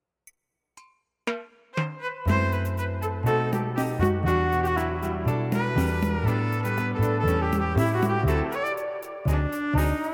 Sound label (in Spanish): violin con pistas tradicional